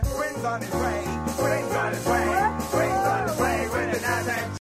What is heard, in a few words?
Is Calling Rap, ringtones